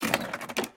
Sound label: Safe Display Roll; Puzzle